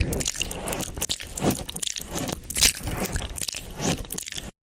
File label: asmr